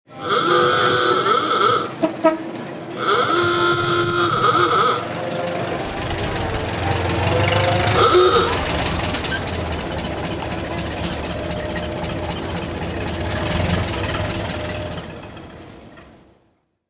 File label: antique car honk